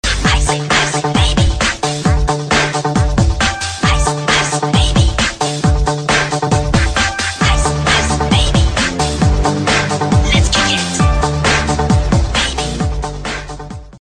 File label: thrilling hip hop cute mobile tone